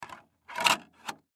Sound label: Dvd Player Disc, Dvd Player Set Disc In Tray; Electronics; Blastwave